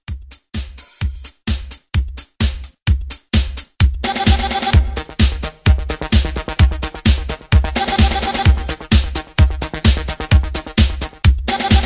80s Pop Re-Drum 129

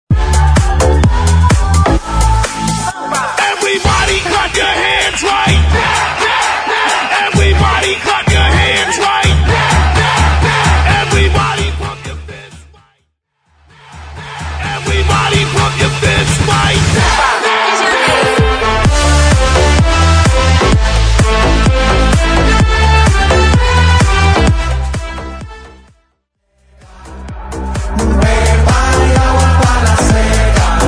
Samba

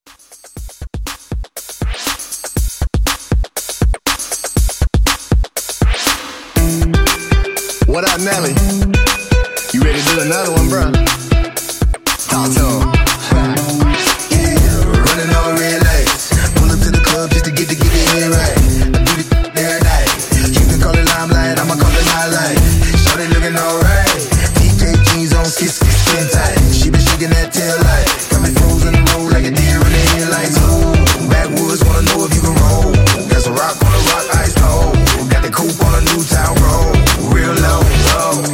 Re Drum 120; Mixshow

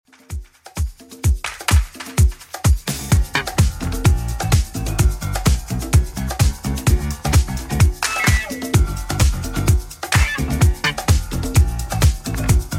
70s Redrum 128bpm

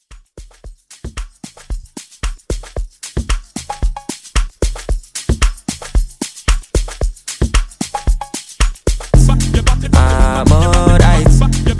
113 CLEAN; Active Club Edit

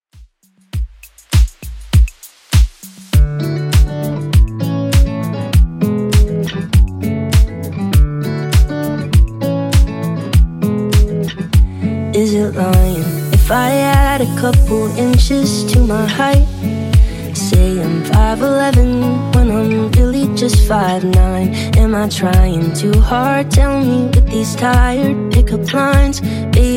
Top40 Redrum 100bpm

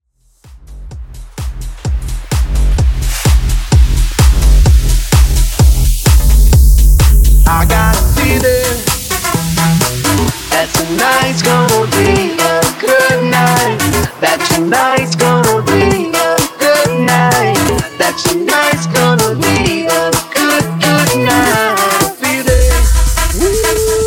Tech House Mashup, 128Bpm

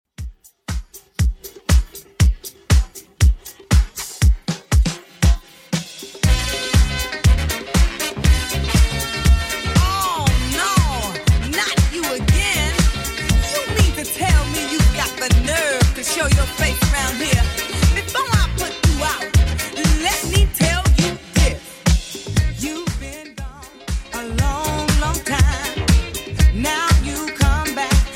80s Redrum 119bpm

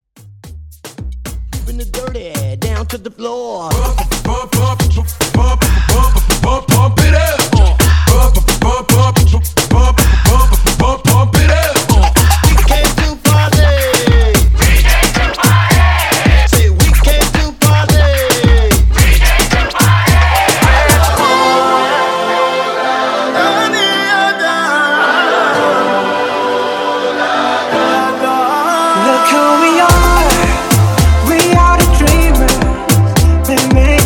K Pop Moombahton 110 BPM

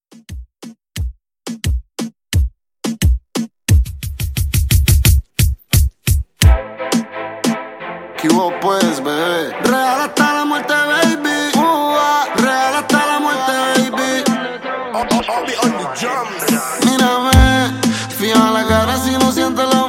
Latin Reggaeton Redrum; Remixfreakz

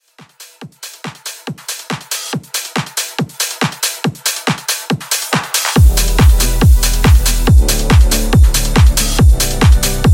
Pop Dance; Remixfreakz

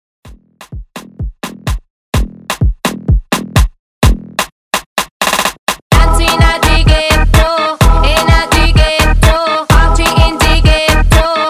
Afrobeat Rebass

